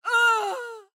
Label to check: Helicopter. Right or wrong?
Wrong